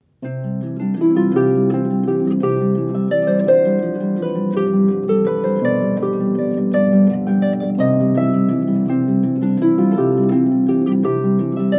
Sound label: Sonatina, harp; Wood